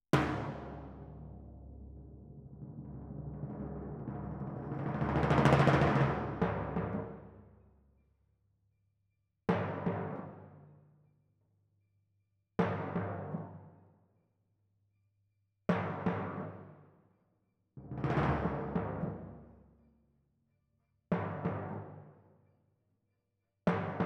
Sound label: Twin F Timp